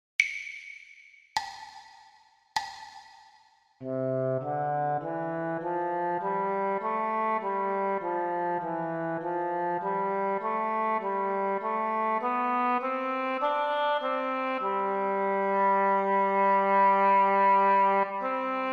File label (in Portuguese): percepcao ditado